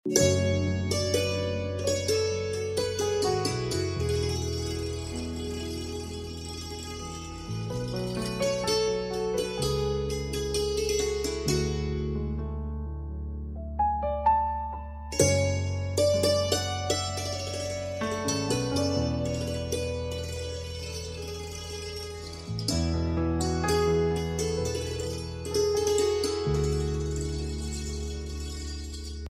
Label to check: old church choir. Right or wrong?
Wrong